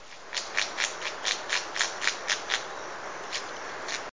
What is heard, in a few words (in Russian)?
aguti dasyprocta punctata